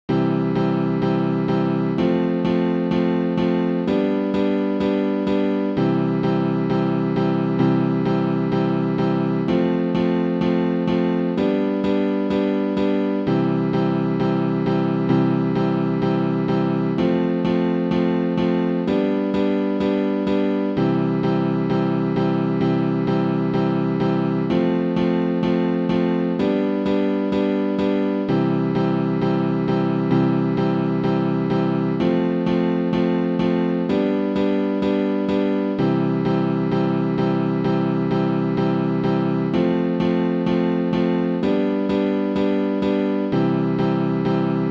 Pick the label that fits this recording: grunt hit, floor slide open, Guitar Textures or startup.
startup